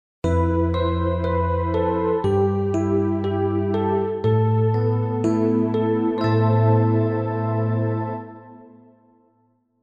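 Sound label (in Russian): успокаивающий ритм фильма открывает фортепианный фоновый звуковой материал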